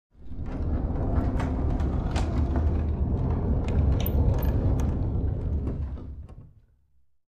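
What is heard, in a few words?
Dresser Rolled On Wood, scrapes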